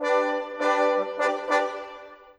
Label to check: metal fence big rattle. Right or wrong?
Wrong